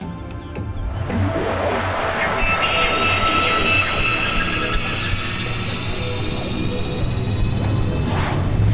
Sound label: validation startup